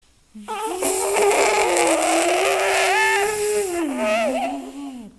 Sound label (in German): luftballon quitscht